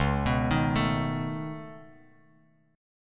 Db 7th Suspended 2nd Sharp 5th, Bass, Db7sus2#5 chord; Db7sus2#5 arpeggiated; JGuitar